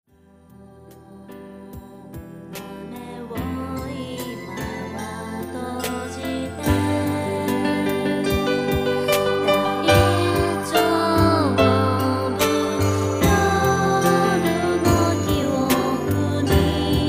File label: lullaby